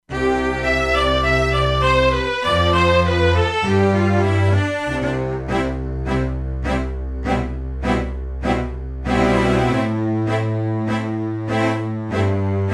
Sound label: Concerto, E-mu Proteus-2; Factory Presets